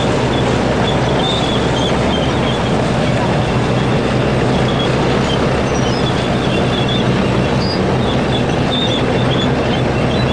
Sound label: tank; mcheli